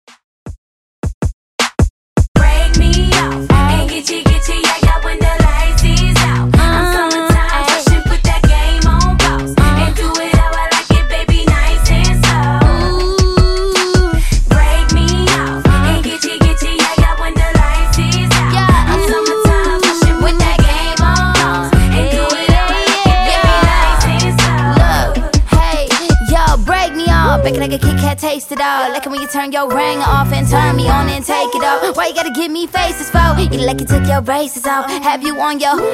Remix Redrum

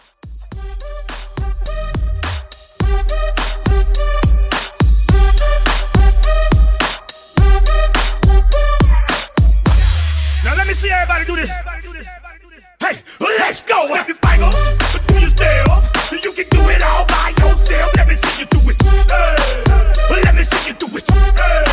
Old School Hiphop Mashup

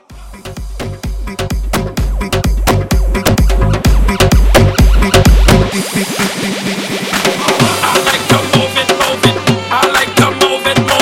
Bhangra Club Hype Edit 128 CLEAN

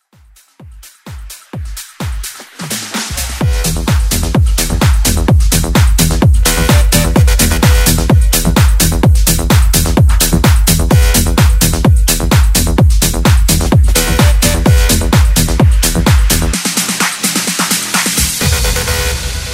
Jump And Rave

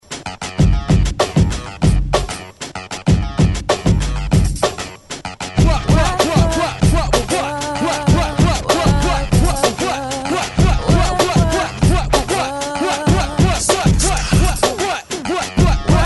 90s Intro 96 Bpm; DJDOLLS

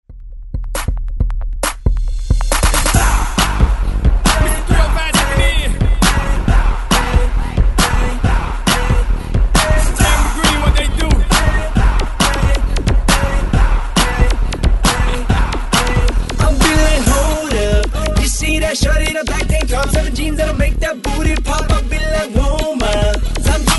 Hype ReDrum 136 Bpm

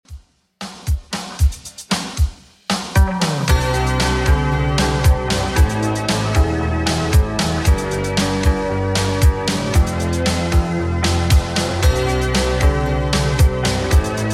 80s Redrum 115bpm

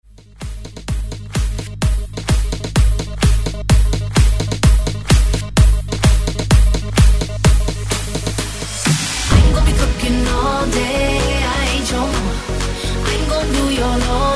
128Bpm BREAKMIX

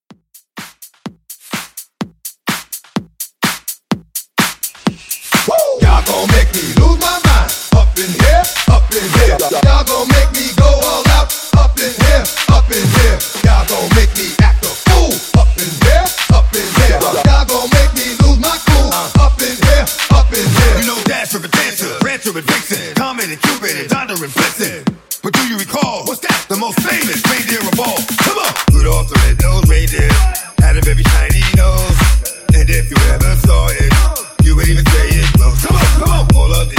Christmas Blend Edit 126

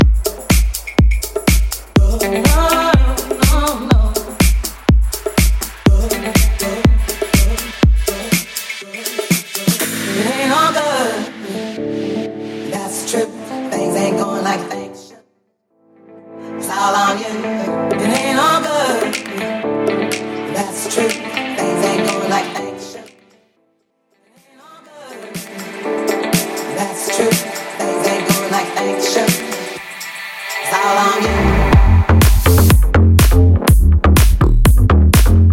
House Mash Up 123 Bpm

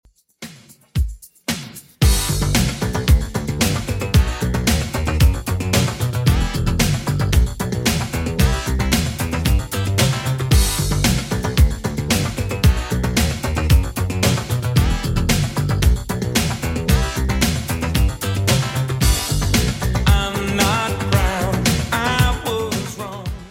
80s Redrum 113bpm

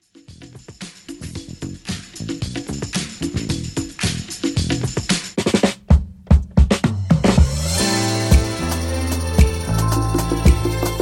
Pop Redrum Intro; Remixfreakz

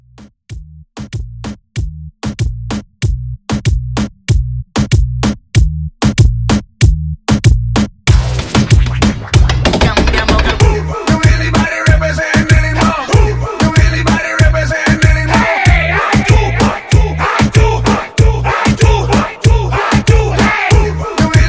Latin Hype, 95; Latin Hype Edit

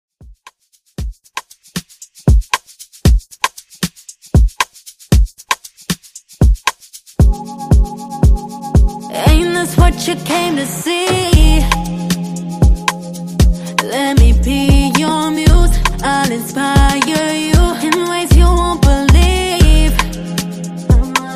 Top40 Mixshow Intro 116 Bpm

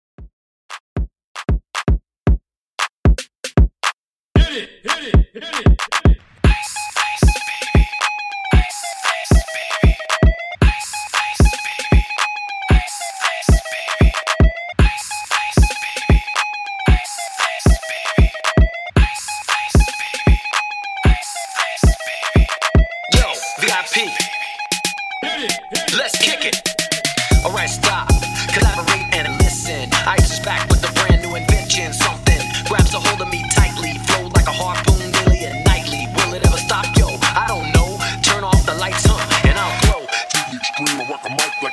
Trap Rework 115Bpm; remixplanet

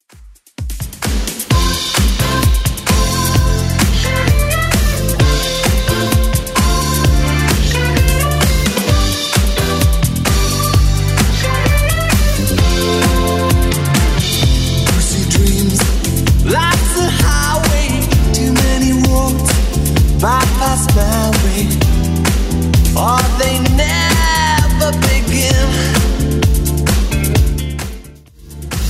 80s Club 130 bpm CLEAN; 80s Club Edit

